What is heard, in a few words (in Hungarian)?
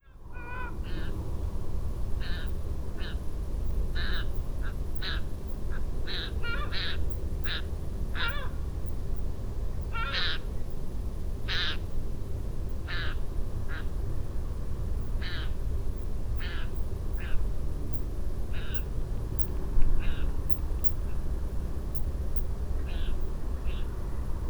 pásztorgém hangja